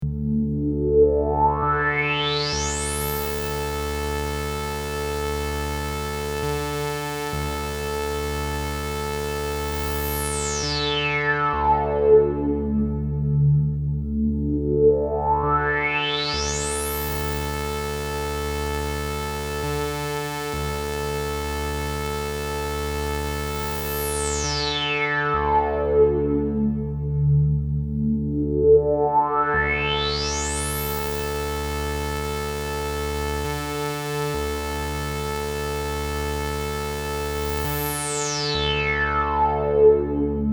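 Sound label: low-pass Filter Sweep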